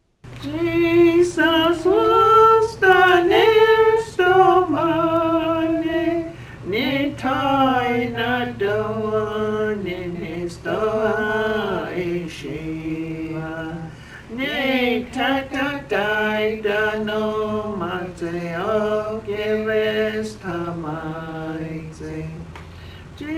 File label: hymnbook songs, cheyenne language; Jesus Vo'estanevestomanehe